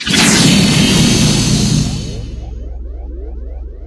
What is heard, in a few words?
quick fix heal; tf